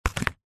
papadum set down stack on another

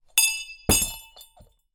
bell school handheld drop on carpeted floor

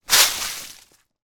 foley leaves dry kick with foot; zapsplat

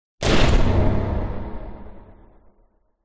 sound design impact slam hit hard drone tail

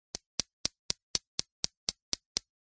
industrial hammer tiny wooden hits stone; zapsplat

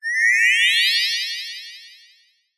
cartoon sci fi laser beam rising thin

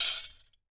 cutlery

